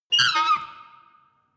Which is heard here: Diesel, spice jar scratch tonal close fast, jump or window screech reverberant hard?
window screech reverberant hard